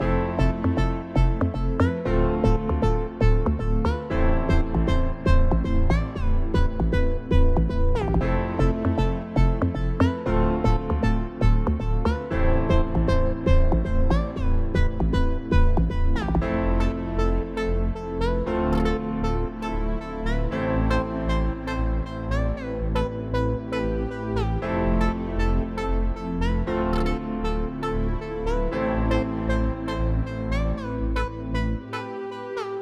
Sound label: 117 BPM A Min, Melodies, Sunset; Cymatics; GHOST (Beta Pack); Blizzard